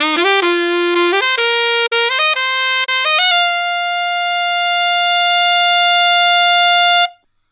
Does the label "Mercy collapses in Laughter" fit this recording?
No